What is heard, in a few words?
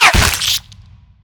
impact, violence